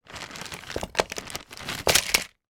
game jigsaw puzzle pieces large fall from bag to surface; sound effects one